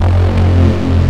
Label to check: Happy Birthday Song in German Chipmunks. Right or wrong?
Wrong